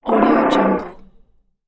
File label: creatures snort